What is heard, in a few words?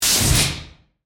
Pneumatic Air Burst, Scifi, Pneumatic, Air, Burst, Science, Fiction; Spacecraft; Spacecrafts; BlastWave